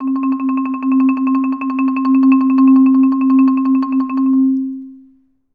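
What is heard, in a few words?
TREMOLO C3, Orchestra; Best Service; Peter Siedlaczek's Advanced Orchestra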